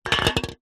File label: food drink beer can empty knock over table